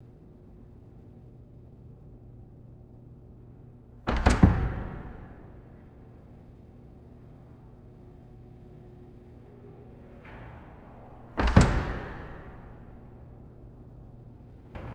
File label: distant door bumping and closing in reverberant hall; doors